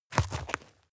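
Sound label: step dirt heavy boots hard, footsteps; schritte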